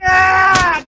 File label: Scream; Wiseau; Beat Saber Miss Sounds